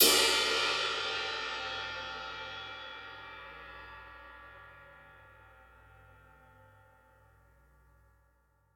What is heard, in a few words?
Multi Crash